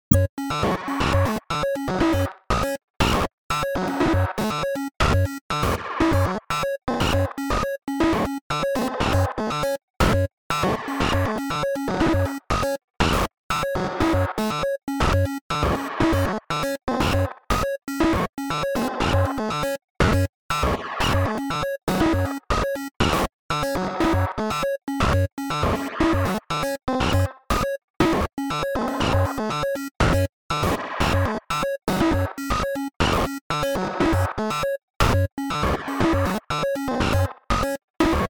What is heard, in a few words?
8Bit Mod Glitch